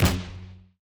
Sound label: hit wave light; CrossCode; battle